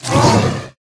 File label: Spider Grunt General Scream Start Combined